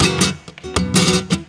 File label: GUIT GROOVE; Best Service; World Colours